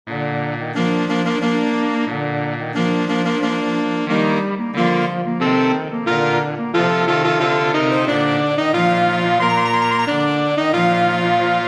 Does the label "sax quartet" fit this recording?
Yes